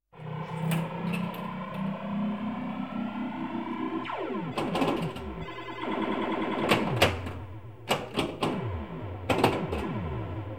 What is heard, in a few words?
Pin Ball Mach General Atmosphere; KMP Film Video Sound Effects